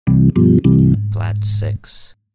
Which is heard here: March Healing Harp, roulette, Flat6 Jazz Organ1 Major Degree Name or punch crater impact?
Flat6 Jazz Organ1 Major Degree Name